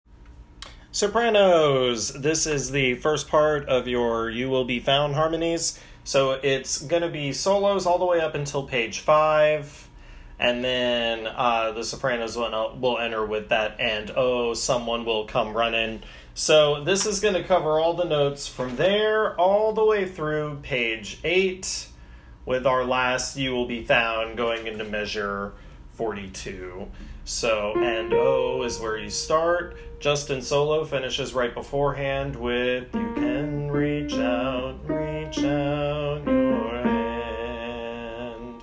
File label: Soprano